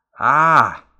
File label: Aaah